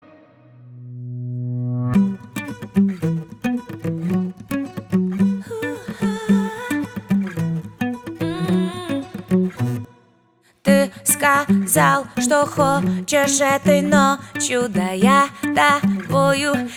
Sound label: Acoustic version